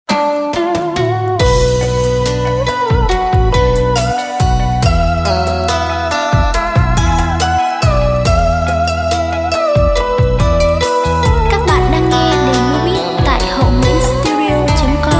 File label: Tieng khoc tre tho beat